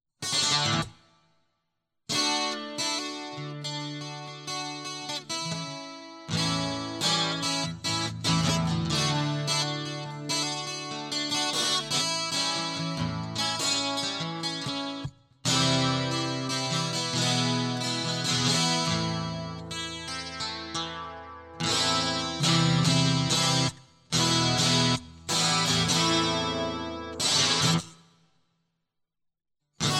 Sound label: Guitar Loop